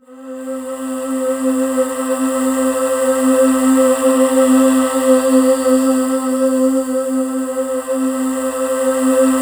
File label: C5, Synth Leads